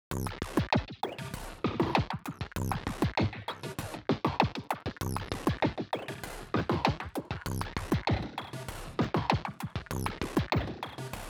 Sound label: Looping Beat; Get Even